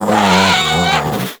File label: Combat Vocal Death; Warframe; Catbrow